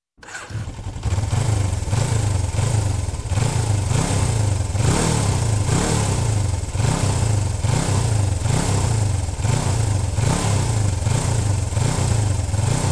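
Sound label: motorcycle engine roar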